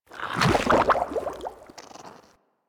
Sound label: coolant bubble; ambience; props; one offs; Warframe; Venus Open World; Venus Landscape